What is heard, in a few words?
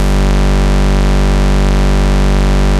E Bass G1, Moog Voyager; Moog Voyager Sounds; VES1 E Bass